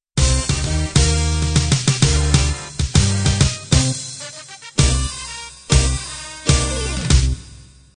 swing band end

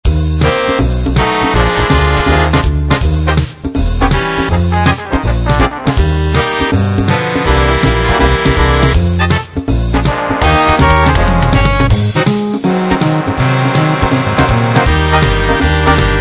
big band

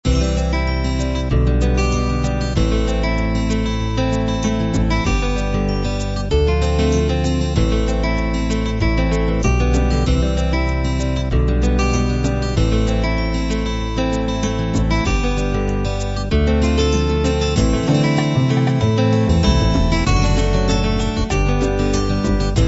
folk ballad

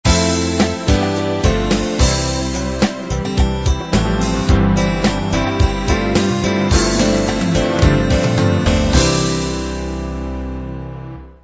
love 8beat end

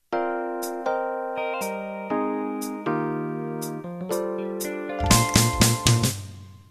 Smooth Jazz Intro

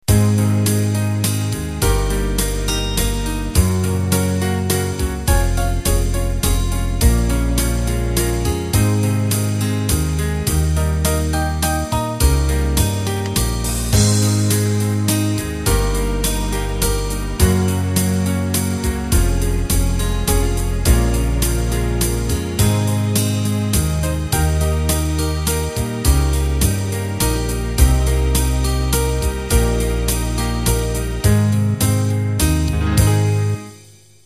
Waltz; Acoustic Piano, Acoustic Steel-Stringed Guitar, Strings and Brushed Drums

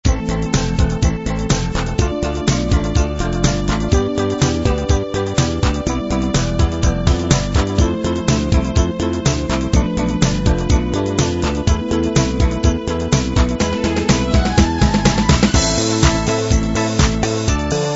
70s disco

